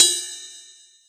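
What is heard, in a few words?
Boss DR-202 cymbal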